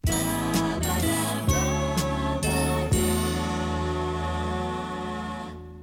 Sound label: topformat maxi jingle single